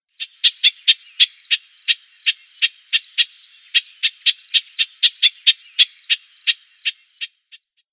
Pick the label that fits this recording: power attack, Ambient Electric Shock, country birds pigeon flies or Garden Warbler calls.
Garden Warbler calls